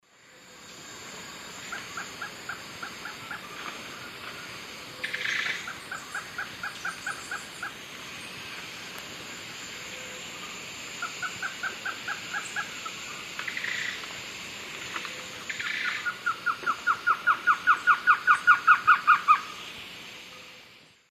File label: Trogon curucui, duet; S. America; Eastern Lowlands